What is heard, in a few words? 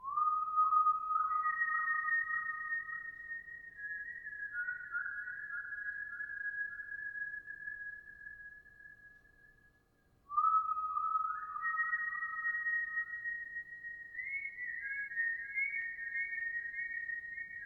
Whistle